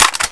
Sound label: uzi clip out, weapons; garrysmod